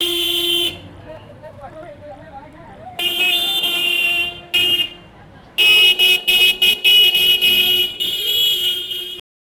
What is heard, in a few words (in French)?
fait le bruit des bip, market